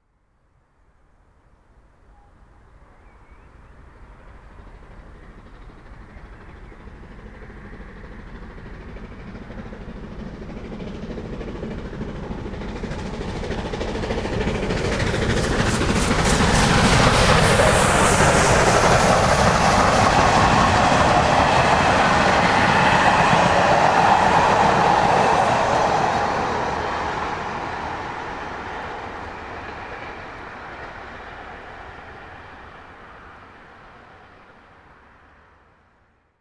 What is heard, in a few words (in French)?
train voyageurs